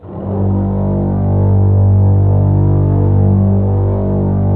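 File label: violins, low violins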